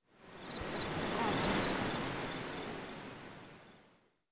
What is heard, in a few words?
CRICK, nature